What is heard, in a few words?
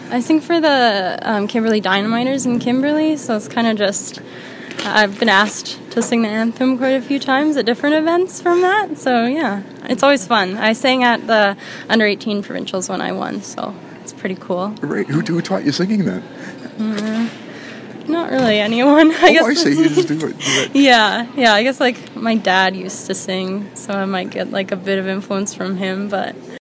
anthem singer